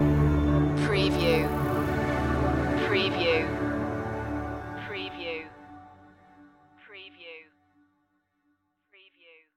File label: rainforest stinger